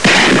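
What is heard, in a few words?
crash scratch; Perc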